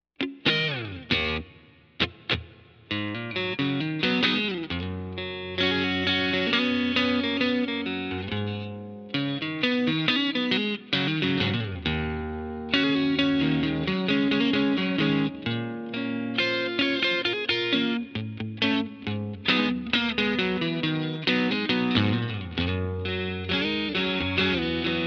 Guitar Intro

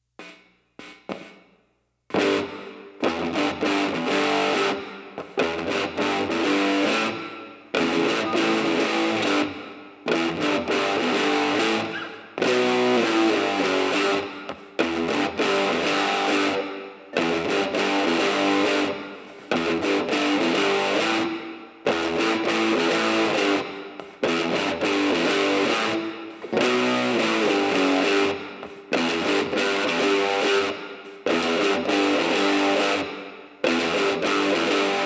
GTR MAIN

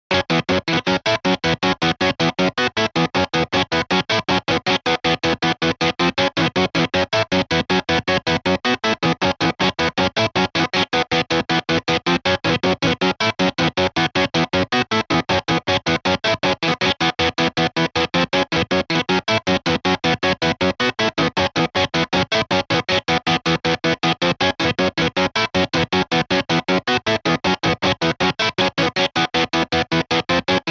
Intro Left; Mr. Tabs

